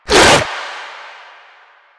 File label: Arm Attack; Warframe; Proto Infested Runner